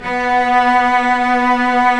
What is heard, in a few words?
Vcs2 f vb; STR Vcs 1-2 vb